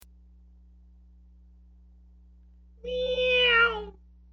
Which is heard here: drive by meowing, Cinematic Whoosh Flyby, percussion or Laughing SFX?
drive by meowing